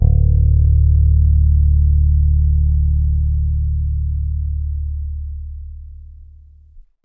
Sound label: Bass Ending, Indie Rock; Sonic Foundry (Sony Creative Software); Indie Rock Anthems; Session 04 - Dubwyzz